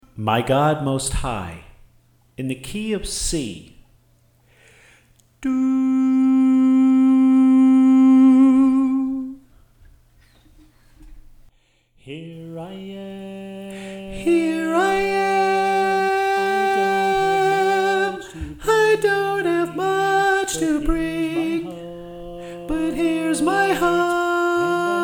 Soprano

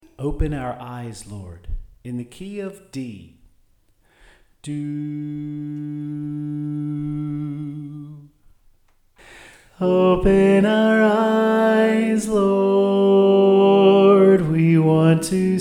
Soprano

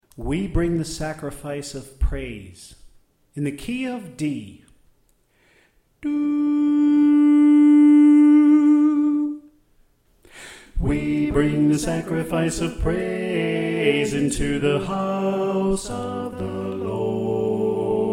Bass Right Tenor Left

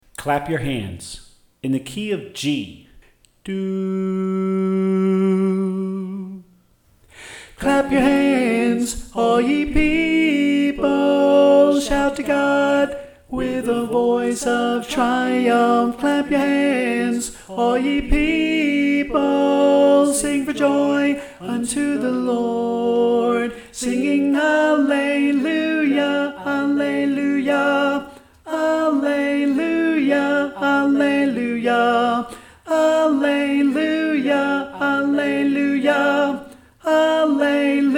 Tenor

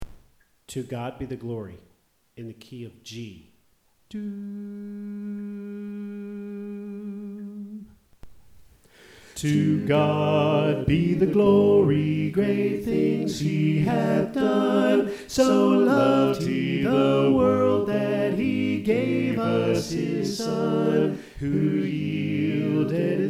Tenor Left

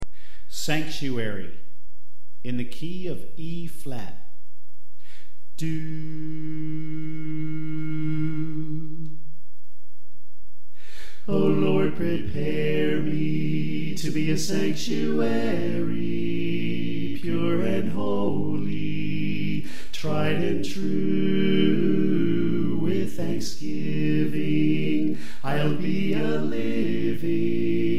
Bass Left

